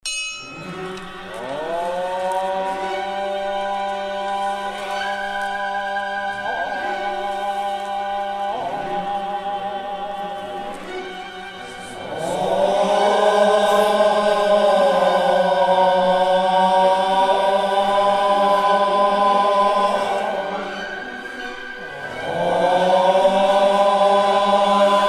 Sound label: bus